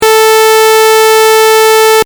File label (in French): 440 scie